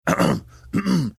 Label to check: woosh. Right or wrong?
Wrong